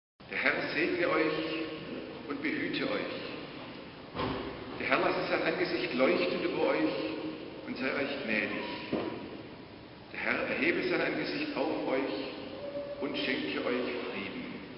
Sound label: benediction organ; After the service, everyone respectfully stayed seated until the lovely postlude ended.; benediction and response; Schwaebisch Hall